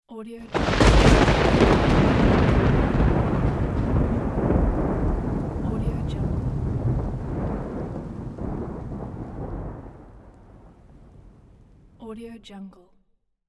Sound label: thunder